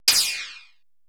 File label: c#2, Procussion Mix, Retro Sampler; Best Service ProSamples vol.55; EMU E-III